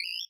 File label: Perc, drum